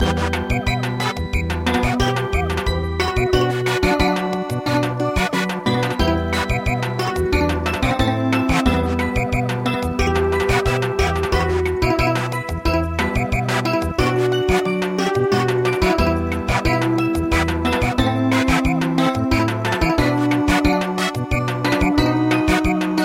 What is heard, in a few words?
Samba, midi